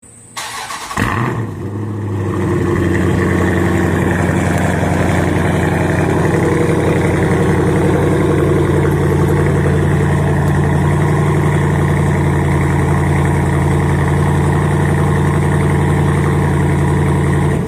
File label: jeep srt8